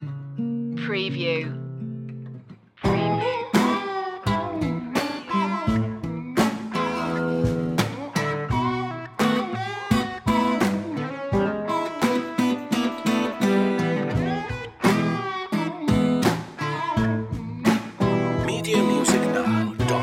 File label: fish fry blues